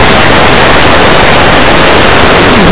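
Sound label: little dog; Blue Heat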